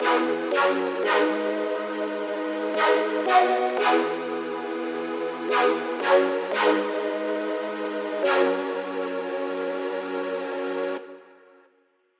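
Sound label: LIQUID DNB SONGSTARTER E 175 CHORDS; KULTURE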